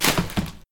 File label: Trash; ld25